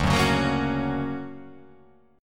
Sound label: D Augmented Major 7th down, guitar